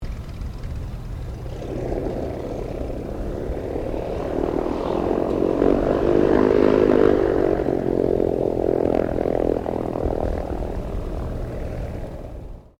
Aircraft P-40 Kittyhawk shutdown distant, WW2 Kittyhawk or Warhawk fighter plane; 1940s; Airplanes